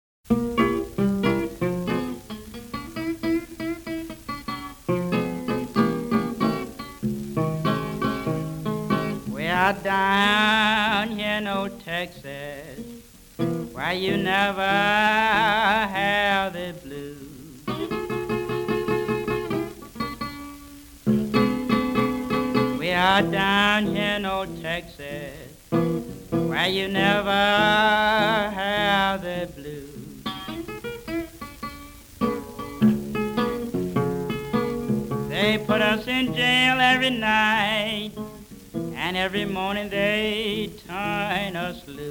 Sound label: Down in Texas blues